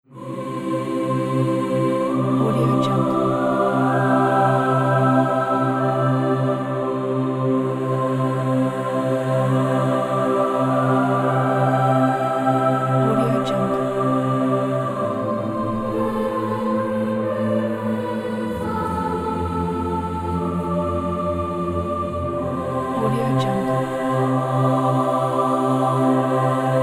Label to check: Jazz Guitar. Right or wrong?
Wrong